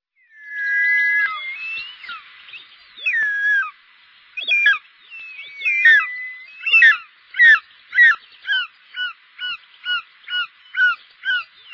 circaete

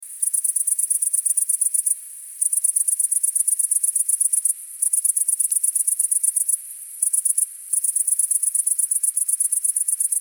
grande sauterelle verte

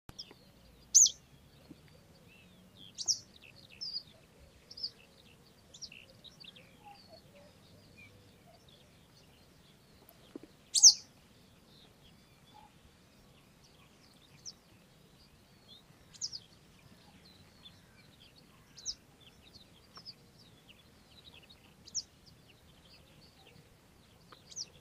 bergeronnette printaniere motacilla flava

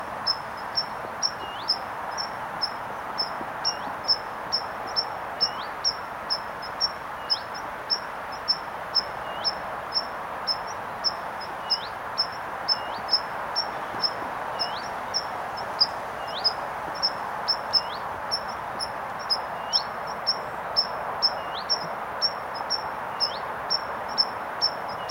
pipit des arbres anthus trivialis

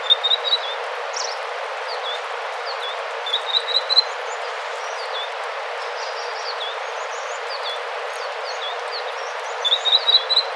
tichodrome echelette

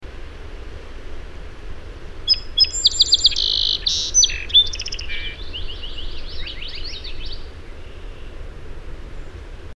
bruant chanteur

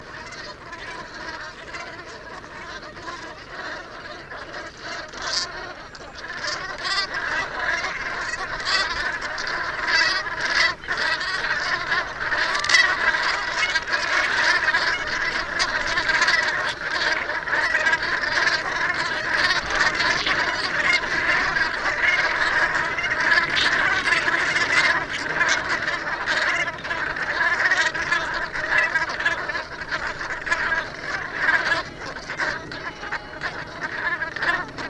bernache cravant branta bernicla bernicla

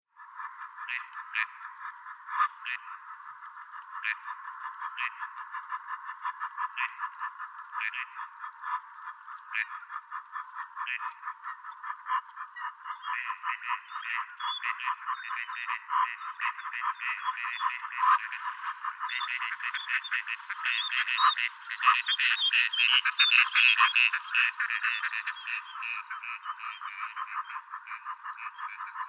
canard chipeau anas strepera

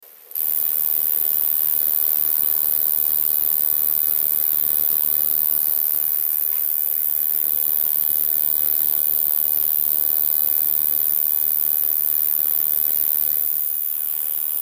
conocephale gracieux

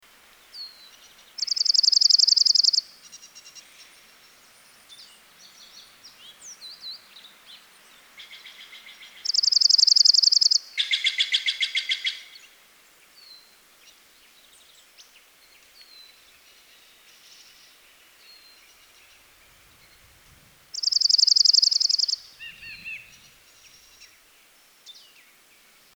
junco ardoise

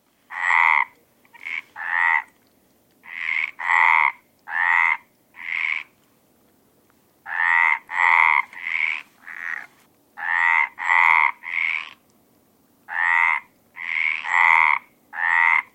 rainette meridionale

